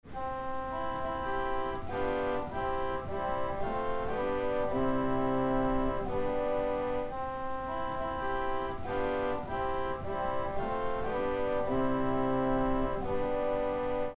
Viol d'amour, Rundfunkorgel

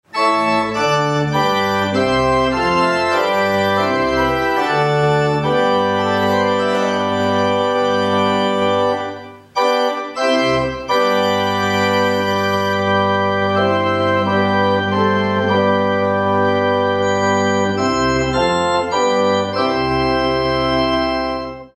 Welte-Klang mit Mixtur, Rundfunkorgel

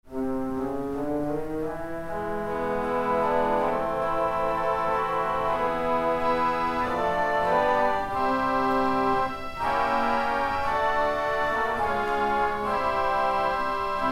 Vox coelestis, rundfunkorgel

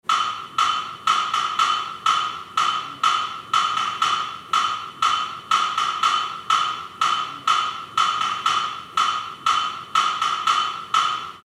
Holzblock

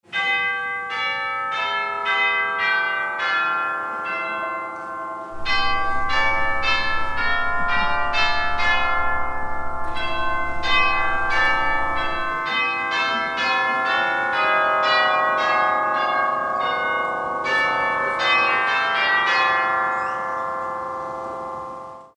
Große Glocken, Rundfunkorgel

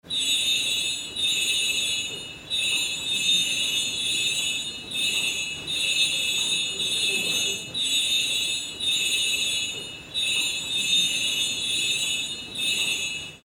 Schlittenschellen, Rundfunkorgel

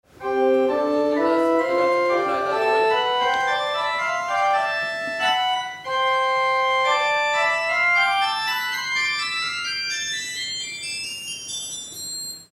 Mixtur, Rundfunkorgel

